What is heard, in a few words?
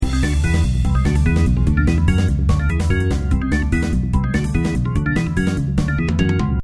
panic; tenaPuyo